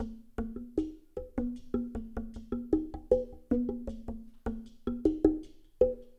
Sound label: SLITDRM; Spectrasonics; Liquid Grooves 1 & 2; AF.PLANET